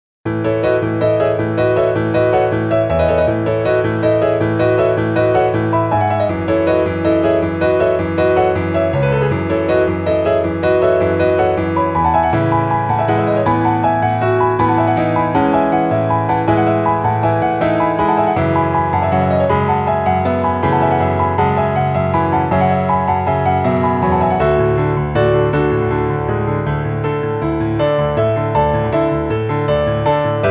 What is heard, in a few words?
piano; アズールレーン